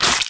gore; dumpster baby titan

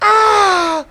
hunter death; catnap n grabpack; elmo

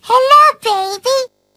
hunter warn; huggy wuggy snpc; elmo

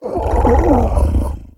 pain, procoptodon; zoochosis pack

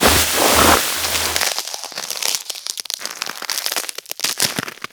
frostbite; blizzard spawn final form

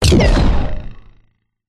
damage; poppy playtime mecha catnap

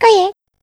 hunter pounce cancel; catnap n grabpack; elmo

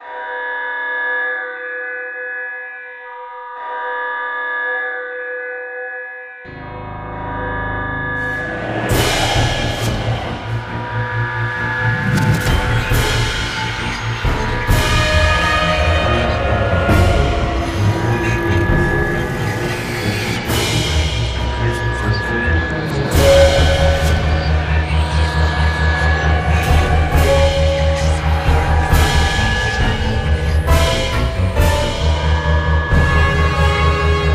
happy room music; indigo park; huggy

